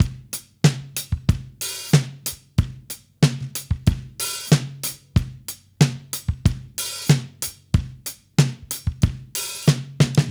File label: Mixed Berry Jam Drums, 70s Analog Funk; Sonic Foundry (Sony Creative Software) - What it Is! 70s Analog Funk